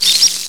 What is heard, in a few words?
Crowd; PGA European Tour